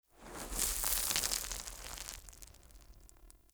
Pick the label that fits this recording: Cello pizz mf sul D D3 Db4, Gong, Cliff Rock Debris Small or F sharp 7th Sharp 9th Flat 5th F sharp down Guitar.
Cliff Rock Debris Small